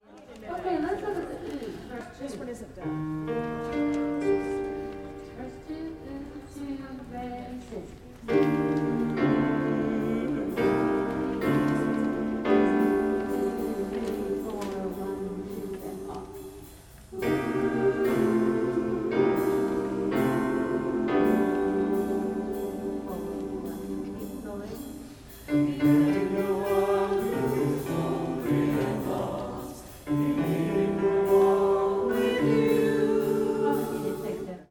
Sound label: oohs